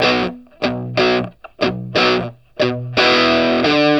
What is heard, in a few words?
Vintage Blues Guitar, 60, B; USB Soundscan vol.22; AKAI